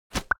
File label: sink, Billiards; VRCBilliardsCE